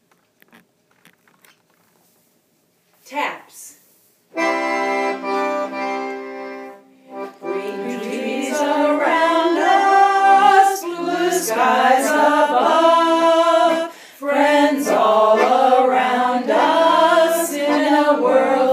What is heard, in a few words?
Taps